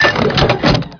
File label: tow reload, tanks; hl1 npc